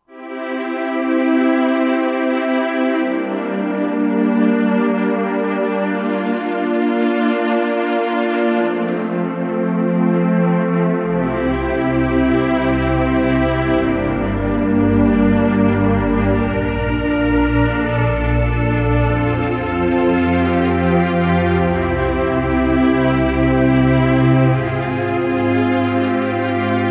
Moody Tron; '60s & '70s; Roland SR-JV80-08

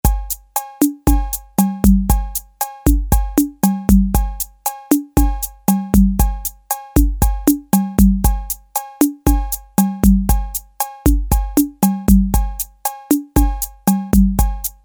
MAMBO, Roland TR-808; Factory

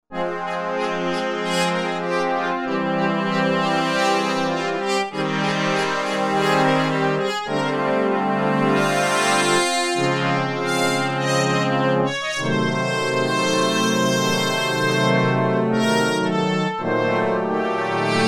Reeds

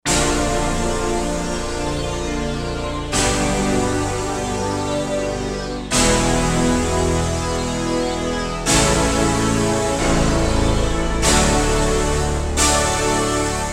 Fuzz Stack; Digital Synthesizer

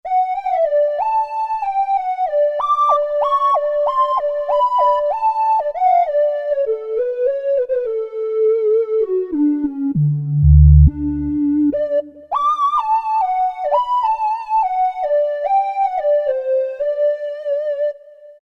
OB 2voice Lead, Roland JD-990; Vintage Board

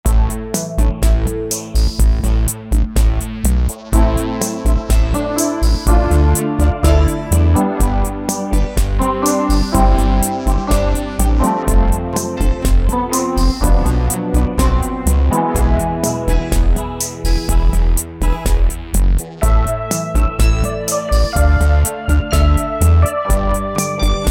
Analog Band

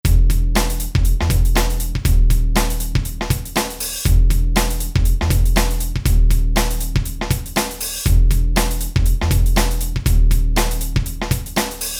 Rap Hip Hop

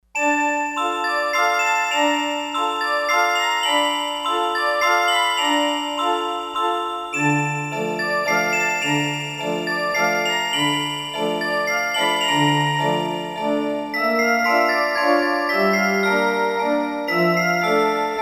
Fantasy Bell, Roland D-10; Factory Patches

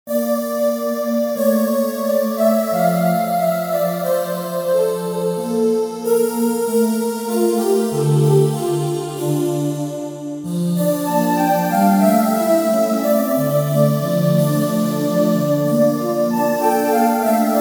Beauty Vox, Roland JV-880; Factory Patches

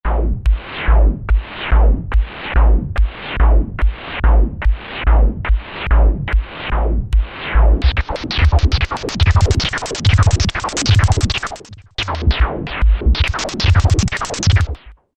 Palpitating, Roland SR-JV80-04 Vintage Synth

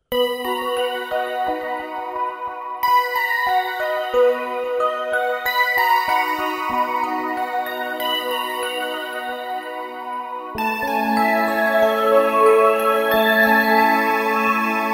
Tinkle Pad, Roland V-Synth; Factory Patches

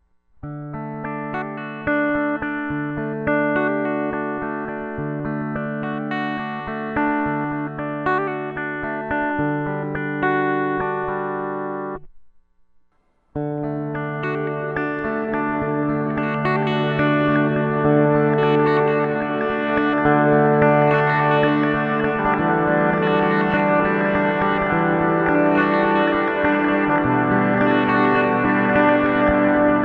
Earthquaker Devices Avalanche Run

